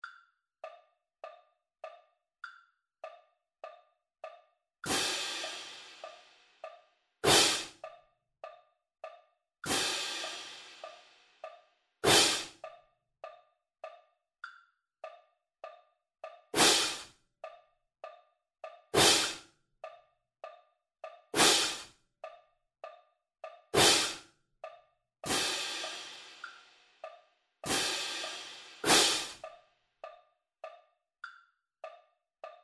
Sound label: Double Beat 100 bpm Cymbals